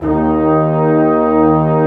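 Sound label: brass section, large ensemble long; Roland LCDP06 Brass Sections; Lrg.Ensemble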